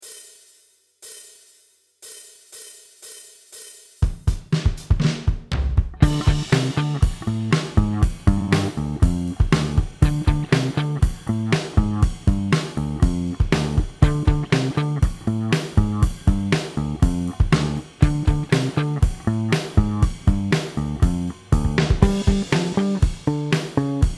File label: Backing Track No Lead